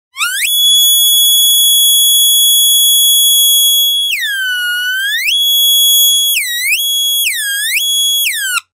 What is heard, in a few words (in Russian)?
pisk na metal